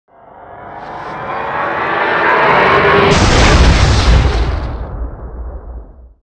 alien spawn; vendetta

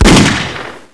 shot, ambience; blackops

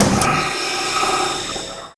medical treatment, buttons; vendetta

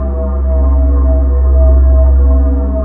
spool hum; vendetta

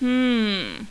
humm

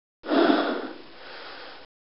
lead pipe breathe; weapons; vendetta

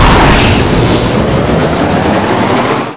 elevator crash; ambience; poke646

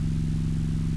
neon light hum; ambience; poke646

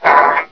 race idle

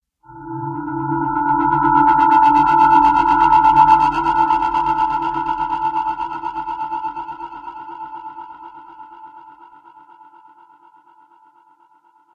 darkness humming; st mission; hs c4

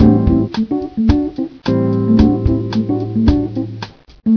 lobby

